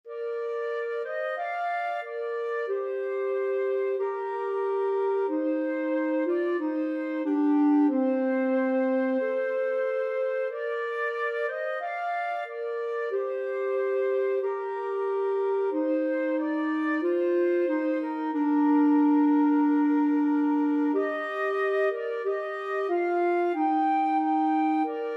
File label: bleak midwinter, flute clarinet duet